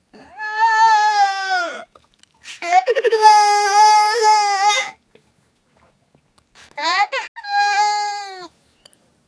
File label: boy smiling